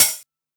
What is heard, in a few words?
Quasimidi 309, Drums